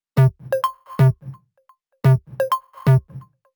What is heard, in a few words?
128BPM Melody; Vengeance Samples; VFH1 128BPM Big Tee Kit